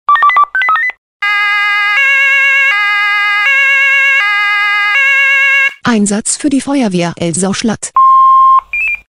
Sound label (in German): SMS Alarmierungstone; FW Elsau Schlatt